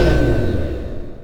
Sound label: Door Large Metal Stop; Doors